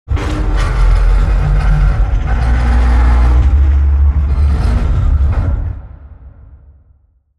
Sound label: heavy sheet metal low groan; Alexander Kopeikin; Black Metal